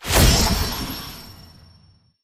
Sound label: Half Power Shoot; Aladdin; Tales of Arabia pantheon